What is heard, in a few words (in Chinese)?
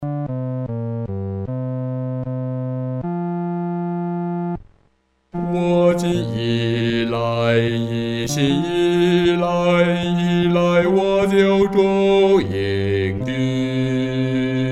独唱 第四声